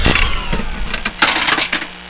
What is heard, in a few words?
death; needleman